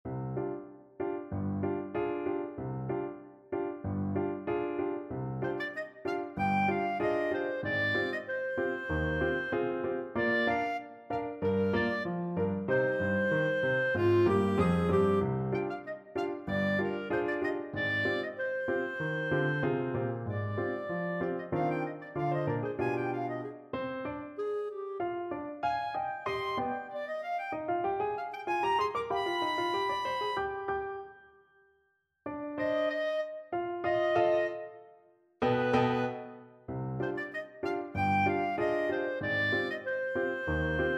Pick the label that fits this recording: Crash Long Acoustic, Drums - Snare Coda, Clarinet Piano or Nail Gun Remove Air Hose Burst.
Clarinet Piano